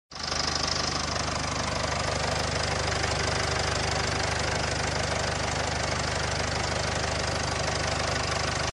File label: glc coupe 250d engine sound; glc-class x254